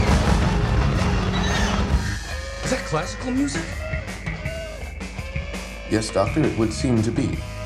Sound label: classical music; ST Rebooted; Beyond